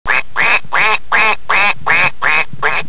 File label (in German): Ente; Stoffentli